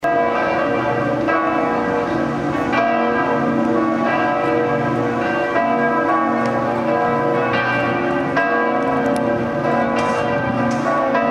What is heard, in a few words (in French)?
cloches